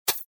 Multimedia Internet CD Rom Flash Buttons Electronic